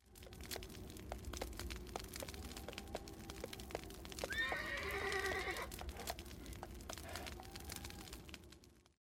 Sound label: Town; Age of Empires III; cinematics